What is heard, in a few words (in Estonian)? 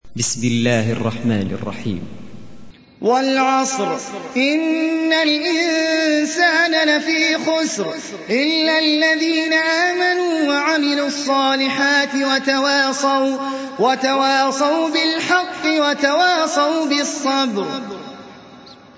Al Asr retsitatsioon, koraan